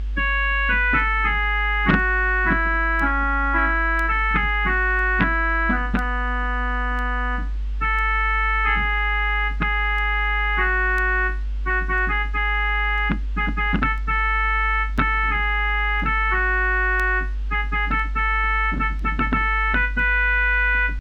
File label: accordion